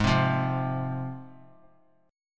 G sharp 6th G sharp down, chord

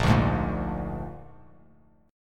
Bb 7th Bb down Bass Standard; strummed; JGuitar

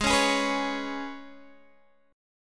G sharp Minor Double Flat 5th, Guitar Open D; 18,16,18,17,16,x

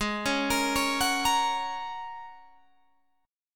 G sharp 9th Suspended 4th, Guitar, Open G; arpeggiated; 18,18,20,18,19,20; G#9sus4

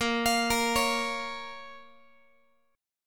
A sharp Minor A sharp down Guitar; x,18,20,20,17,x; arpeggiated; JGuitar

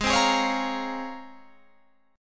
C sharp Major 13th, chord

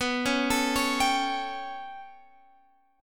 B 7th Suspended 2nd Sharp 5th B, Guitar, Standard; B7sus2#5 arpeggiated; chord shape; 19,16,19,18,20,x; JGuitar

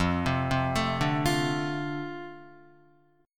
F 6th, Guitar; F6 arpeggiated

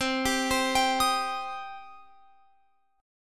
C Minor C down Guitar Open F; Cm arpeggiated

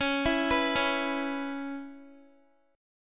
C sharp Minor 7th C sharp Guitar; C#m7 arpeggiated

